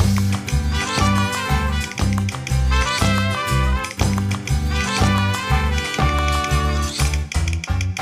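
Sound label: food world music inferno suspense no vox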